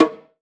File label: tom drum one shot d sharp key